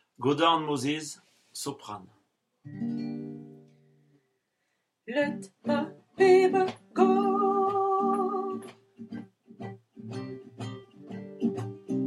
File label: soprano; go down moses